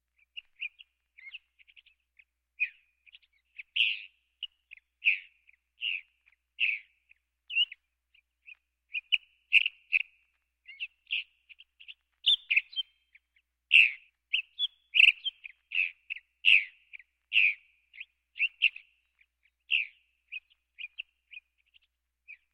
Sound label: Birds